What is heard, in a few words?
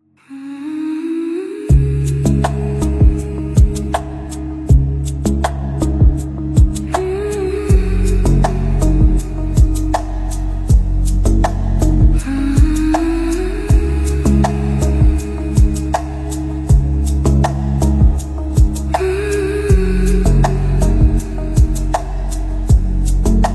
Tantric Music